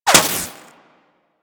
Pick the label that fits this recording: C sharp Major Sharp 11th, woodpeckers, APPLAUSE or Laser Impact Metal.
Laser Impact Metal